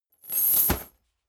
boxing punching bag metal chain fall down; boxen

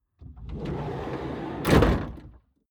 car slide door open

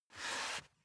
step barefoot wood plank slide normal; schritte; Footsteps

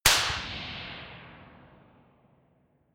gun slapping pop gun; schusswaffen

